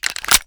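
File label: pistol inspect mag in; reloads; gmod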